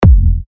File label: 114 F, techno kicks; Shape; Revealed Techno Kicks Vol. 10